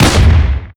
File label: cannon shoot; weapons; loose cannon; garrysmod; tf2